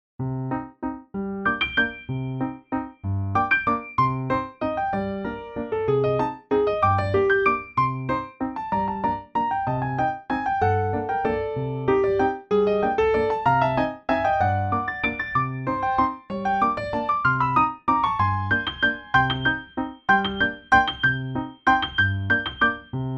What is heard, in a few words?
waltz, piano duet